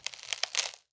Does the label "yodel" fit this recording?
No